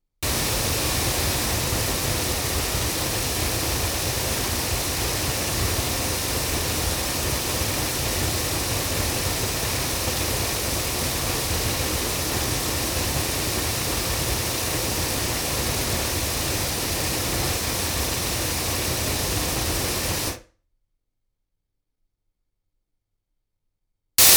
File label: 8 loudspeakers; studio projects